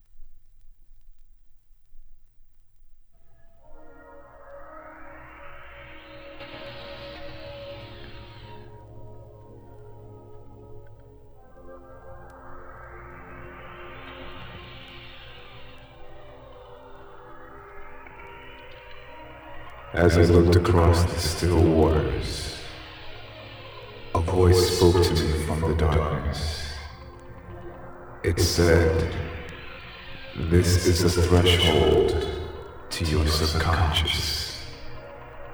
(Acapella)

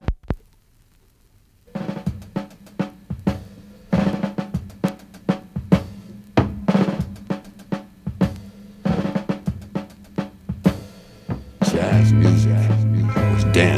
Jazz Interlude

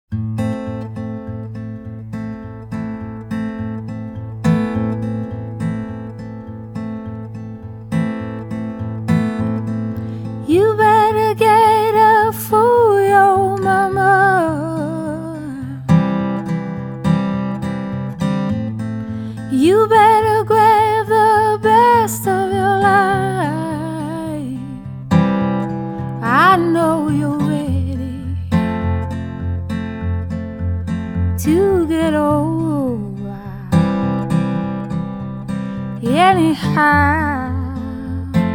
Acoustic

